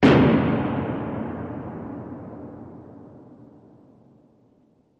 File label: Reverberating Metal, large